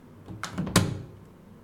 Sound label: door close